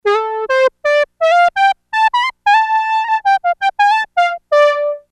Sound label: EWI